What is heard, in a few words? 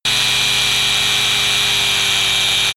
saw compact free